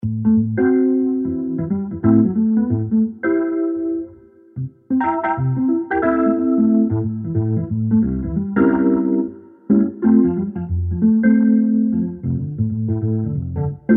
Classic Electrics 200A Rotary Speaker; EZKeys

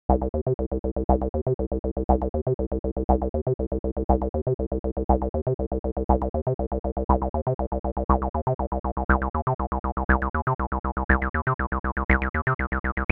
ABL3 Square

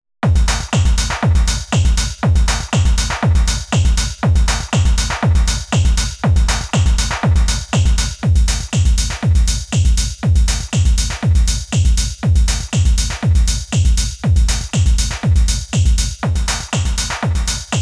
EQ SITRAL 295; ARTURIA

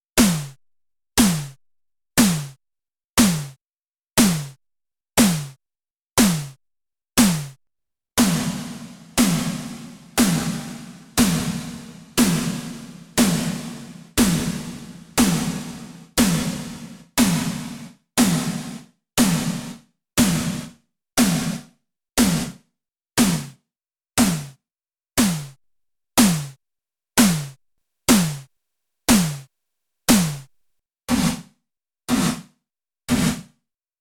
Gated Snare; EAReverb 2

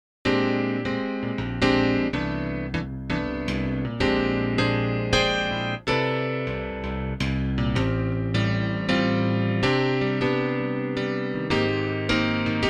cp70; Arturia CP70 V

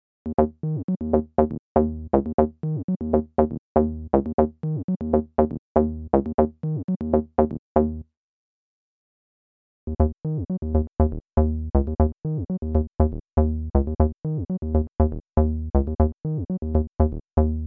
SQR; ABL2 versus ABL3

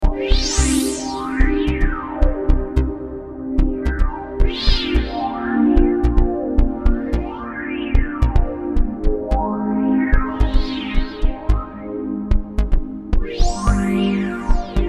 JU-06 2 sounds FXed

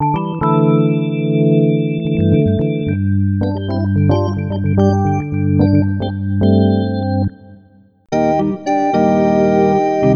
Organ

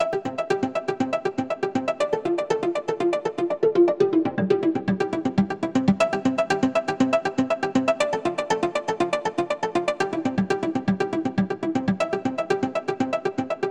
Augmented Piano Arp

